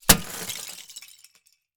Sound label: Crashes Plastic Debris Falling On Plastic Tub; AdobeCC